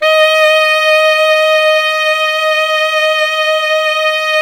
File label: saxophone alto, saxophones; Roland - LA Composer vol. 1